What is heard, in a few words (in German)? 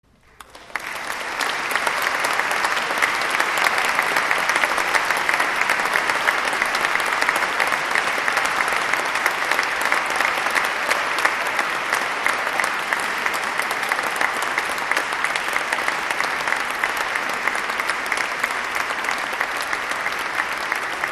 APPLAUS; Junges Ensemble; Christmas Lullaby